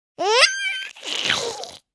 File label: tickle; CosmicRage; general Baby